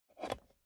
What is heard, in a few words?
Car Classic Bentley Eight Metal Ashtray Opening Slowly Single; Transport